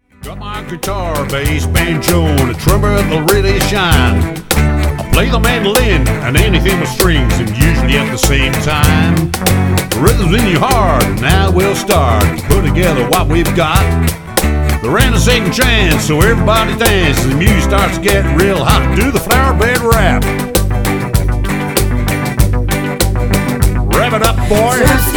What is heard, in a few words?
Bluegrass